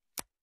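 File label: cell close, beeps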